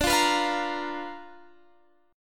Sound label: B Minor Major 7th Flat 5th D, chord